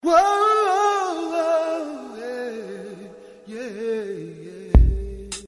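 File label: singing sms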